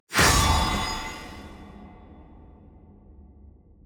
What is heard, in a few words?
ui button click; riven; spirit blossom